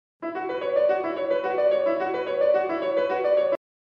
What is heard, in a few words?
Piano Phase